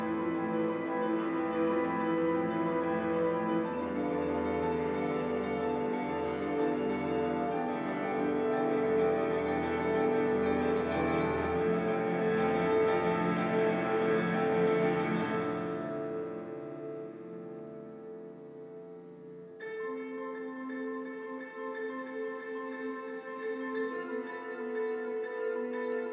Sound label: piano; queen elizabeth hall